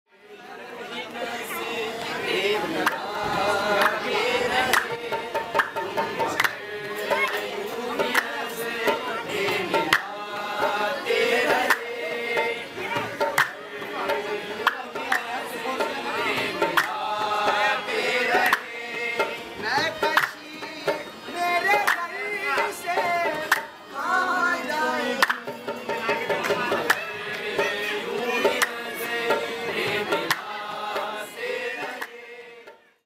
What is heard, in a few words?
haji ali, sounds of mumbai